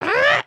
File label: Charge Grunt; emotes